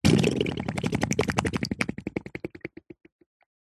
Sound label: cartoon slime bubbles; zapsplat